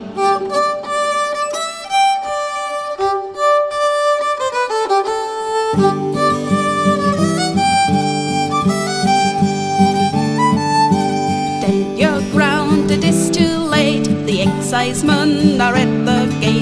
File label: Hills of Connemara